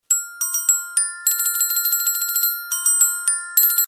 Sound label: Bells 3d Sms